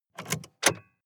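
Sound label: sports car interior key pull out short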